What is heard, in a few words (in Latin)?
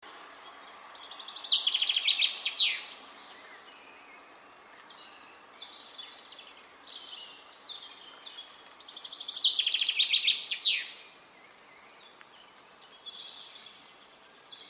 Fringilla coelebs; SETE